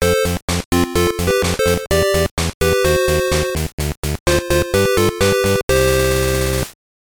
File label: Jingle, GBC; Sylvanian Families Otogi no Kuni no Pendant